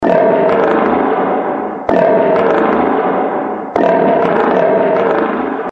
tiger

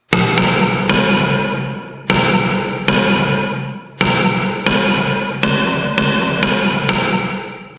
cutaway

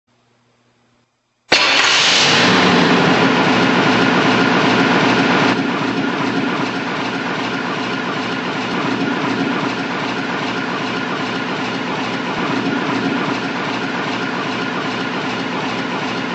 staring long

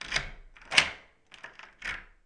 Key Wood Door

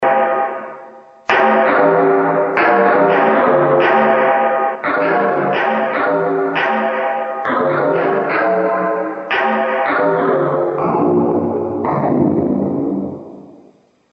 cutaway

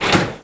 Industrial Door Hit

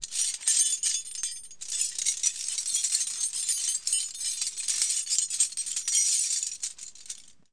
Key Jingle

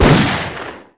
Arcade Explo A

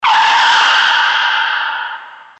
braking

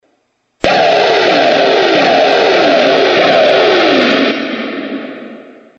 cutaway short

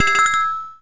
Arcade Chime Small

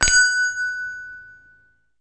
Bell Shop Hotel Ring

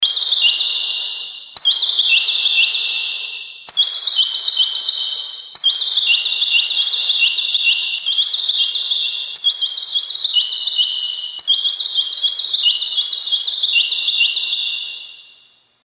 birds many

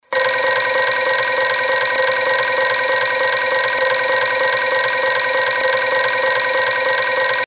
bell long

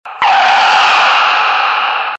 braking

